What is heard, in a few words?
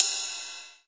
RIDE MID, Power Drumkit; Hip Hop; STYLE KITS (Hip Hop, Etc)